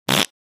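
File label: Fart Foley Short; farts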